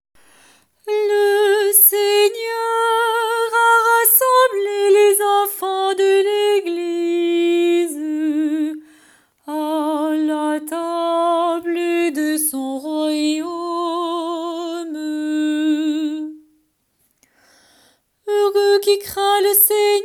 psaume

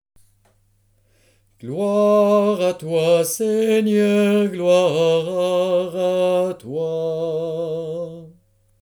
Prière litanique

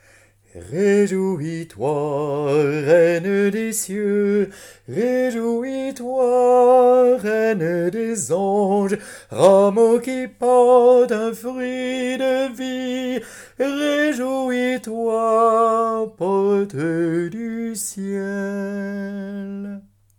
Chant marial Rejouis toi

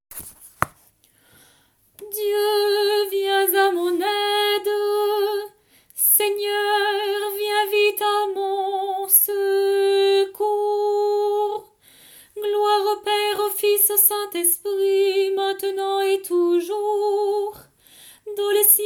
Vigile Intro

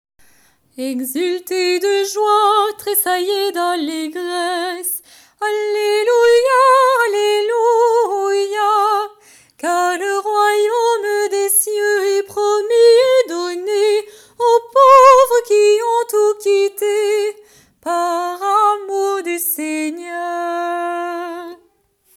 Tous les saints chant final

